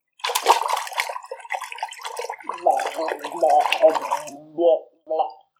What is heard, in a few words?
drowning